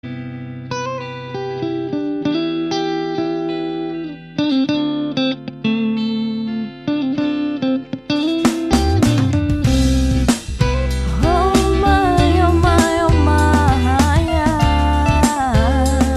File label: guitar will fly